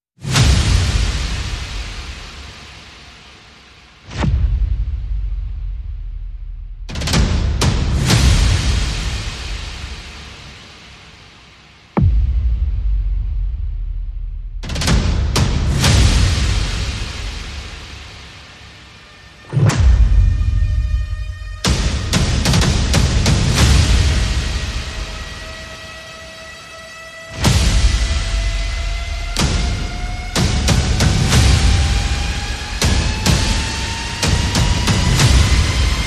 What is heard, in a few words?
Percussion and Effects